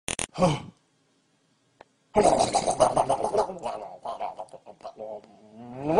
licking goat; goatlick

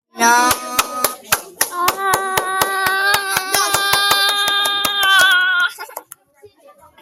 clapping

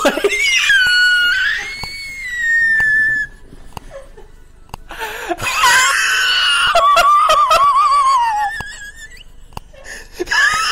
laugh induced asthma attack

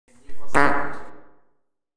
fart

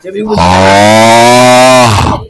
aaaaugh

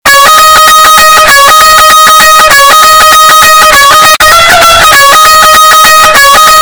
bass boost

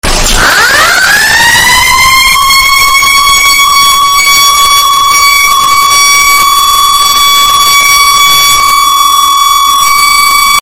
super loud siren

